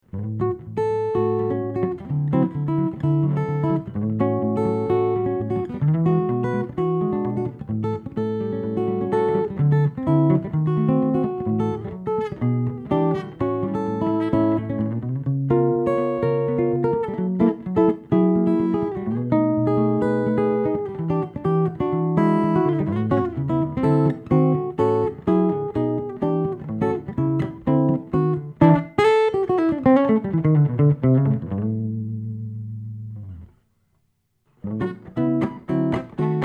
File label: McCurdy Milano custom double cutaway, Curly Maple/Sitka; The Milano by luthier Ric McCurdy, is an extremely impressive semi-h